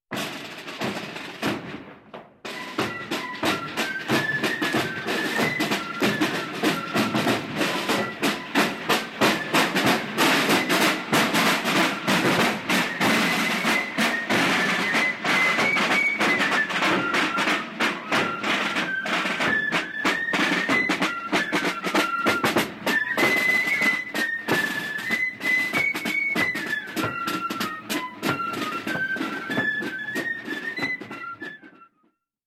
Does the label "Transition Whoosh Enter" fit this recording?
No